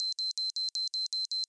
c4 beep; czero opforce

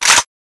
c4 close; czero opforce

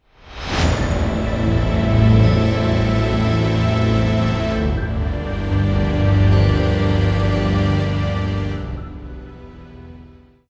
round lose, music

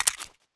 p228 clip out, weapons; czero opforce

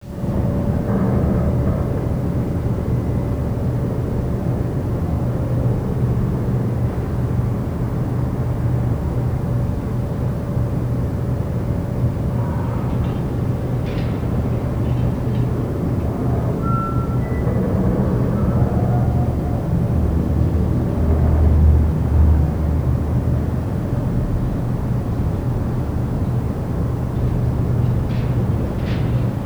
ambient indoor; valve; moab compo27

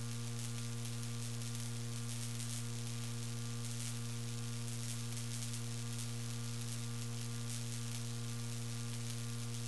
florescent; kopovcasino

